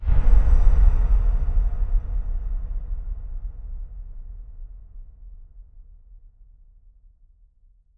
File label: enemy assassination stinger; Aragami